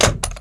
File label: weapon shotgun impact; physics